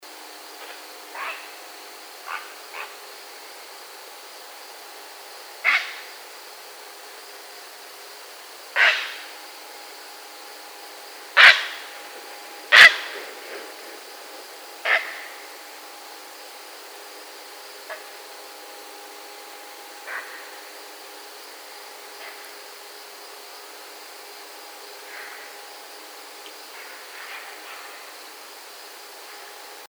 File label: Great Blue Heron